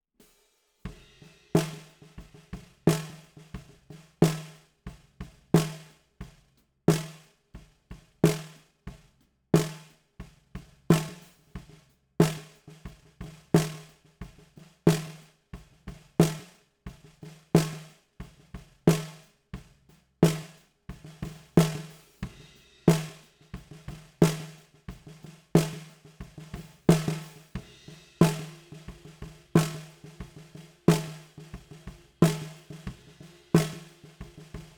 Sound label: SNR